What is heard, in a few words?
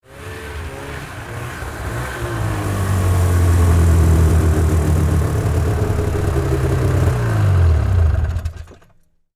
Snowmobile Approach, Sports, Snowmobile, Approach, Shut, Off, Yamaha, Exciter, 440; Miscellaneous Sport; BlastWave